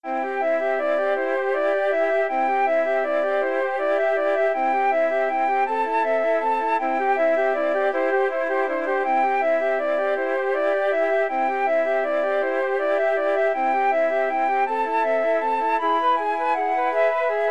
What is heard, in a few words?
morning, flute trio